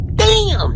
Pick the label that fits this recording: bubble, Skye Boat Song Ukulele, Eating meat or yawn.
yawn